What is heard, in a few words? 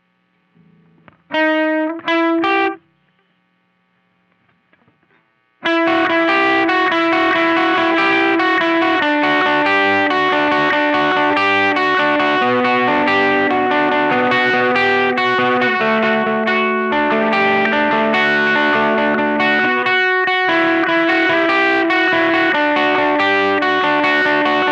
Riff Slow